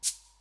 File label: desktop switch right; sound-theme-pika